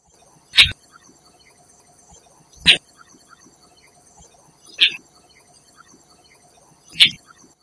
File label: Asian Dollarbird Calls, Chemor Perak Malaysia